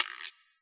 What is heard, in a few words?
acid jazz guica; Dr 202; Percussion